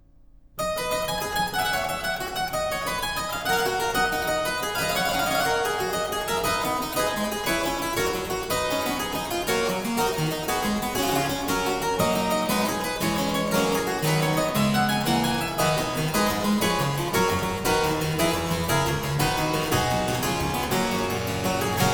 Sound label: Suite in C Major Gigue, Harpsichord